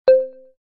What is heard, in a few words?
Key Tone; Pantech Pocket